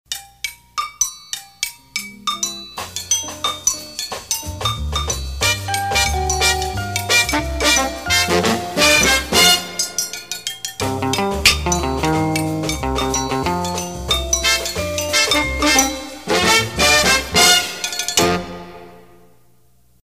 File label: Cowbell Sig; PAMS Numbered Series; WONE